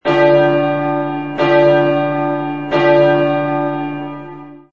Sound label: classic, doorbell